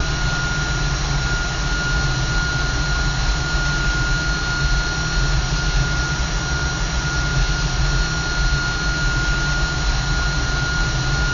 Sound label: cfm spool, CFM56, cockpit; A32X